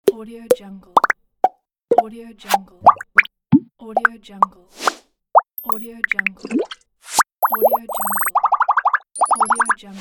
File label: pop bubbles sound effects pack